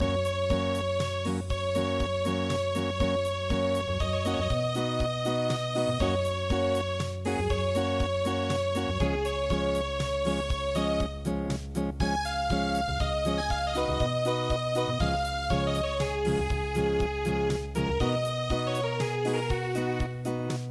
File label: Unplugged, midi; gwang han ru